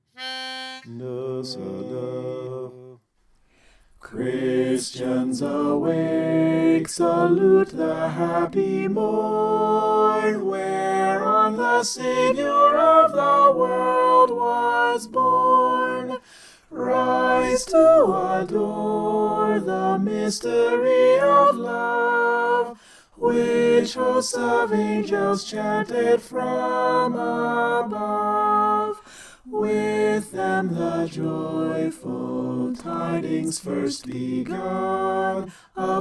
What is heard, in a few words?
soprano